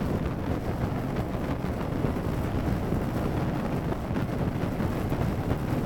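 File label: fire jet loop; Smite; Susano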